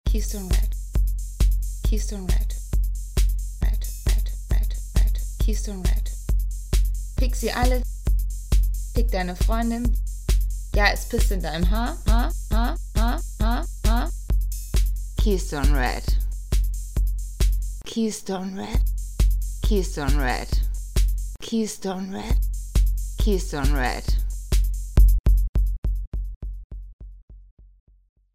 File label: techno